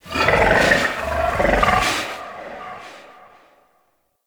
Forest Distant Medium Creature Medium Gurgle Snort; Ambience; Warframe; Grineer